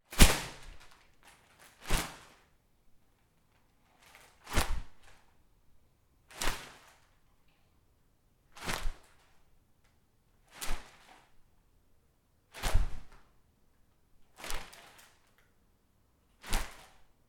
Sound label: Slam Whip Paper Impact